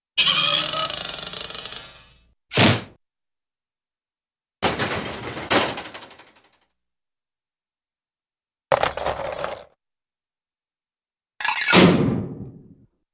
Metallic Ringout Thump Rattle Ratchet Bang, cartoon; Hollywood Edge; Cartoon Trax